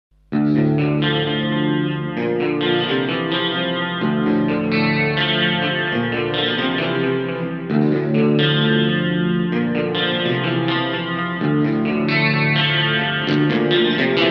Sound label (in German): Clean